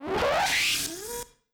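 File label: teleportation machine receive short; teleportation machine 01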